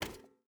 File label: footstep barefoot metal walk; Rust